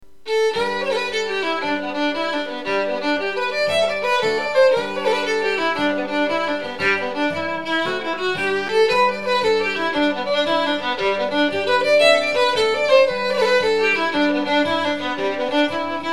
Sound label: jig with guitar faster than we'd do it, fiddle